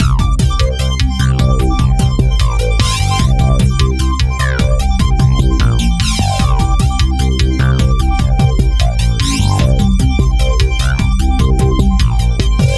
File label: Loop